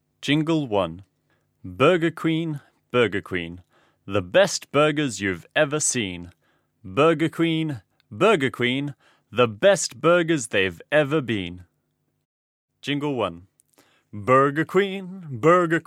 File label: Jingle; poems and songs